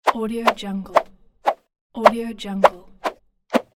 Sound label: cartoon throw whoosh; eytan-k